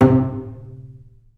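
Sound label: solo pizzicato, Strings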